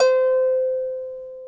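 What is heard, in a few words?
harp C4, Elizabethan harp; InVision Interactive; Lightware VOL-1; Instruments & Percussions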